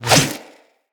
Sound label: Electro Prod Whoosh; Weapons